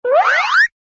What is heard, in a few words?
Toon bldg grow